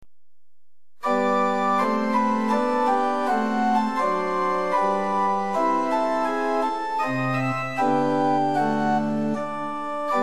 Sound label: Liturgical Organ Books, Pitch 440 Temperament Equal